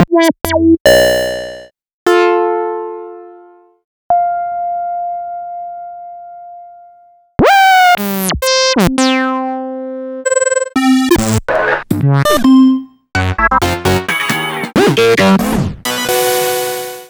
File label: Synth Sounds